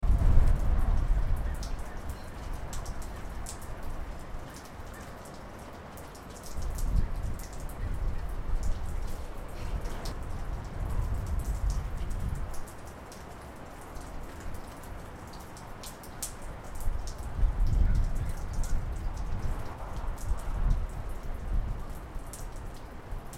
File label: rain and wind; ca solvang